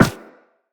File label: Run Metal, footsteps; Characters; Operator